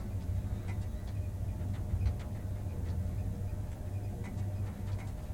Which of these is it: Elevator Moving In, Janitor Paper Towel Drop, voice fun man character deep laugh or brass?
Elevator Moving In